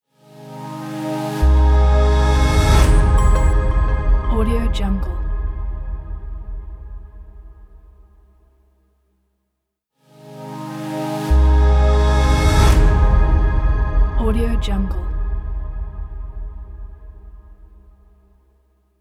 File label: modern logo